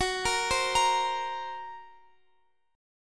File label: Gb Major Flat 5th, Guitar Open F